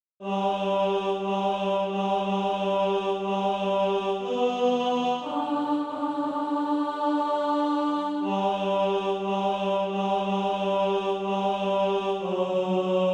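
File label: tenor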